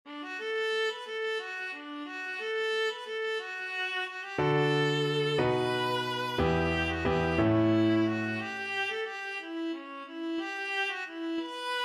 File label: blow the man down, viola